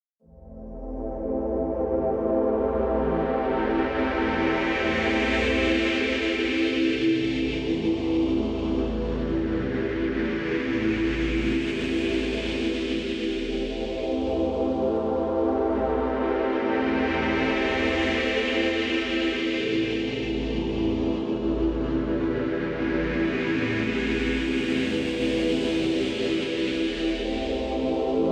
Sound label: Drone; bignic domina official soundtrack; Drone One Twenty Two